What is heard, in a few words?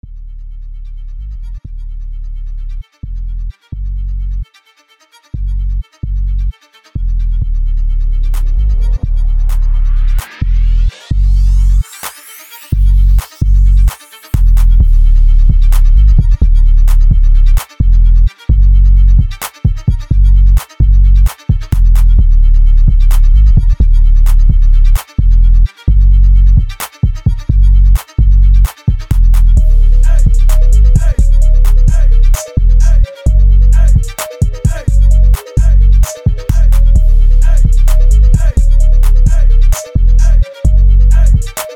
bass boosted; Brazilian Beats